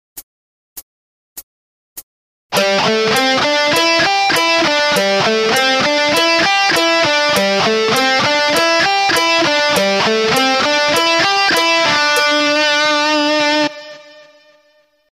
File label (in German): uebung, penta